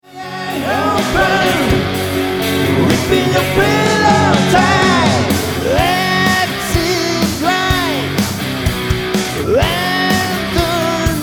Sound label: Bass